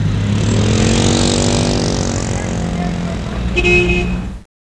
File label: moto hor